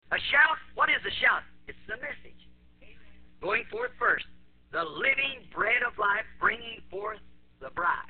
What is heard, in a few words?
shout